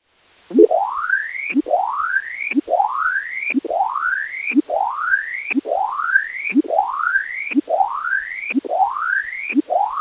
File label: fast sweep dstar